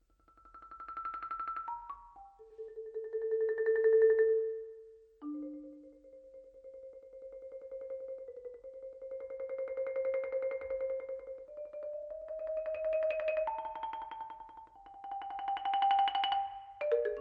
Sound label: Marimba Music, Theme, Cantible, very freely